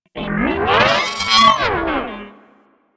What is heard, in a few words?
Leech General Dialog; Leech Osprey